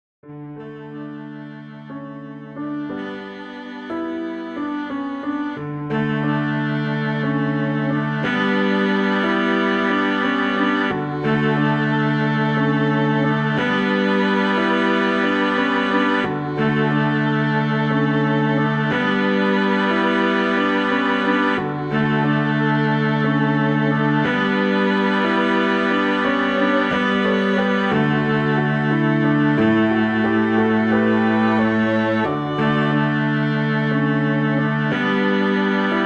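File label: high accompaniment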